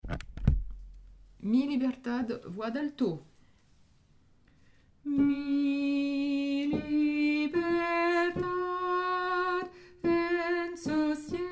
mi libertad alto

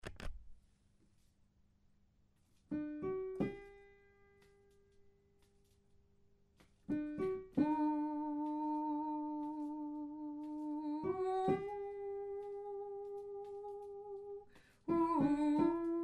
butterfly alto

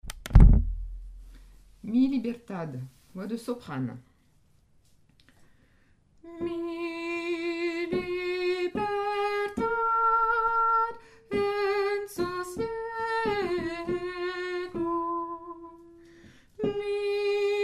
mi libertad soprano

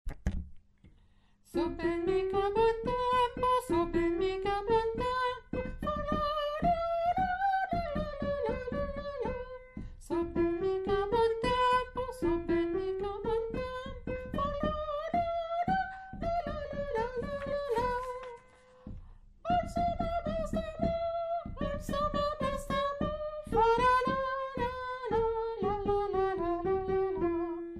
so ben mi ch'a bon tempo soprano

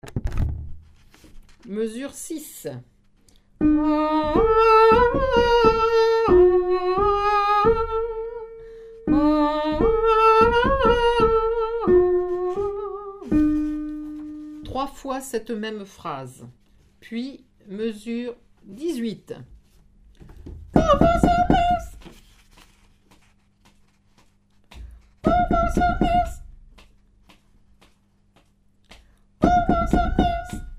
tenor, chorale; lamentations

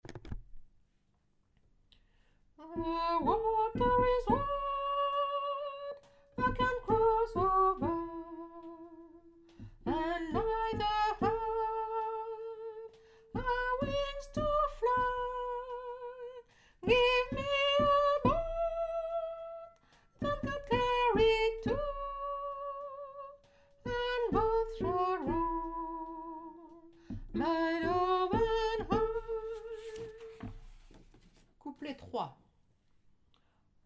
the water is wide soprano

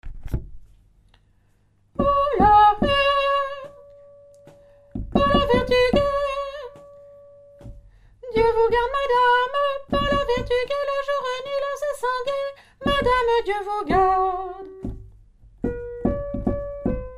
hola he tenor

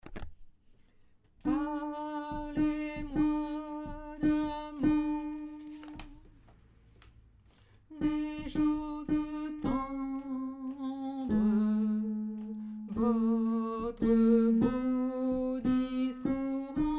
alto, chorale

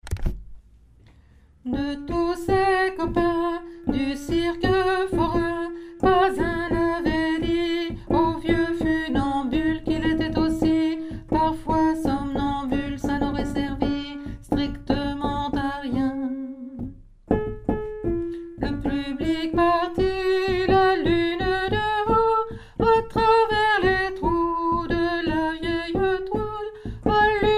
mezzo; choeur

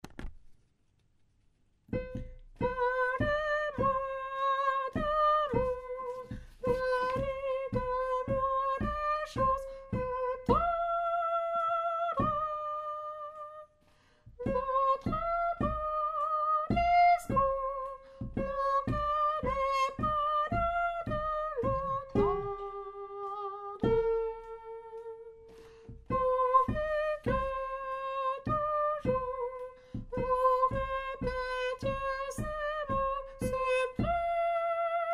tenor, chorale

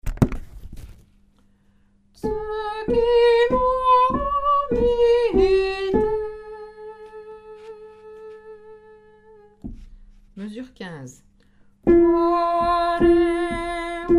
mezzo; chorale

